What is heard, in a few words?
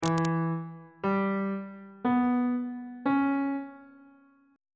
d double sharp minor 6th chord intervals